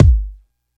small reverb kick drum single shot g key, steel kick drum sample